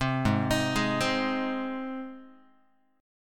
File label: G sharp Major G sharp Guitar, chord; arpeggiated; JGuitar